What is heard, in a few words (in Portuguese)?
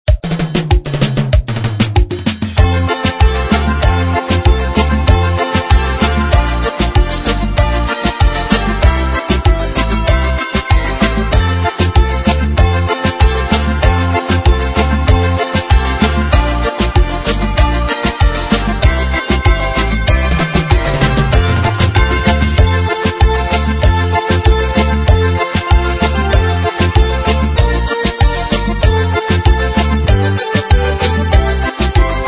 Instrumentais, Forro; Jingle Bells